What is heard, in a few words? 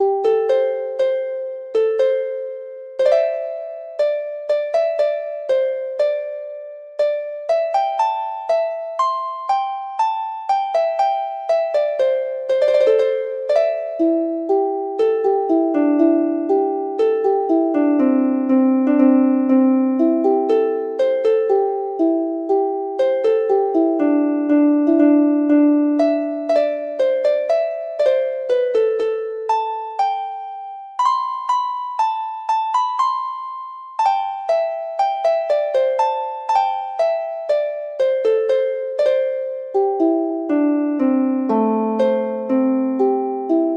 harp